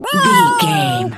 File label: cat scream